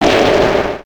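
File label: Explosion Short Echo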